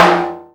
HIGH TIMBALE; Greytsounds VOL-1; SDX PERC

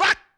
VOC MAD; AMG - Vince Clarke Lucky Bastard

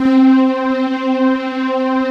ANA STRINGS, pads; Pro-Rec - Synthsonic

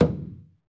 Skin Drums

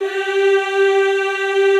G3, Extended Classical Choir; Best Service

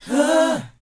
BOYZ, Vocal, R&B Dance

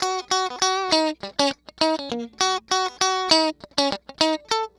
Electric Guitar

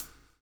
BLUES TK, Backbeat; Spectrasonics - Backbeat

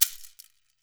ganza; E-MU Producer Series Vol. 7 – Old World Instruments

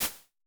Shakers, Vermona Mono15 Drums

